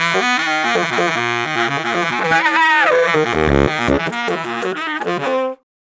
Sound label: Baritone Sax; Sonic Foundry (Sony Creative Software); Jazz Solos and Sections; Traditional